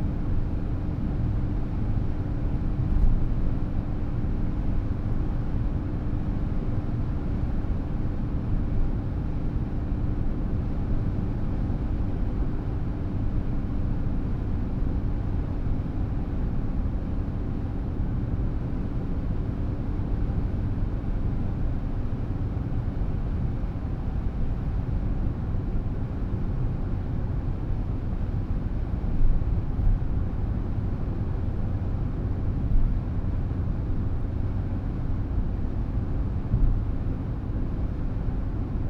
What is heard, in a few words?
Car noise; dedra 140